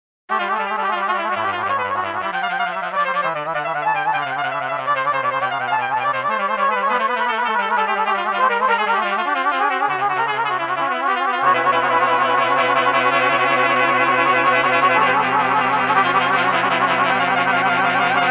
heavy vibrato

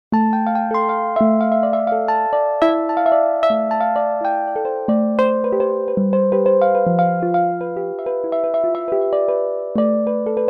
Harplike

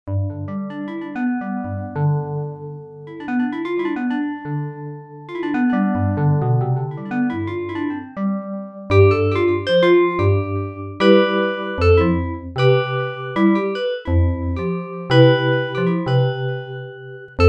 Sweet Tine, Baby Piano

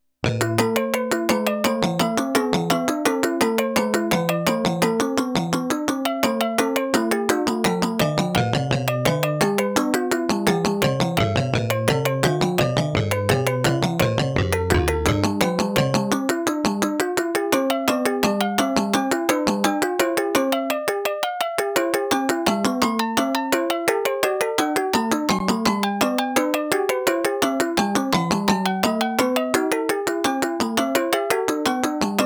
Bach tuning

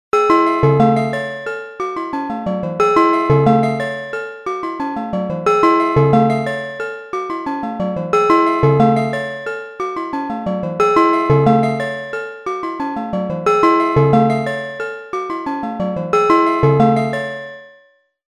Celtic Dreams

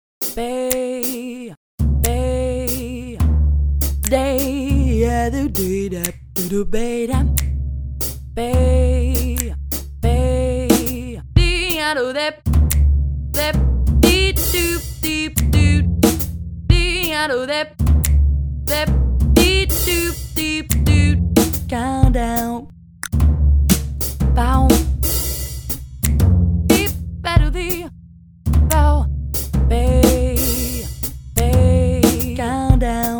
tribal

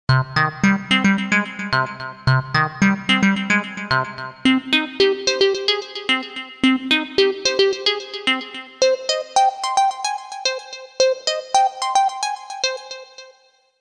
Plucky delay, Baby Piano

